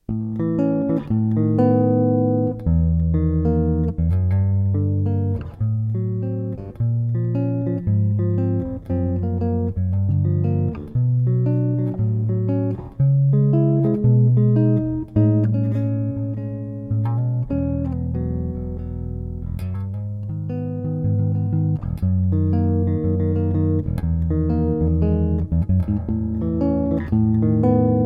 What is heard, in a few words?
Solo Bass